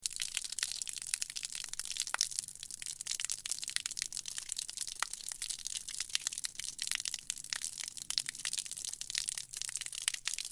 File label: water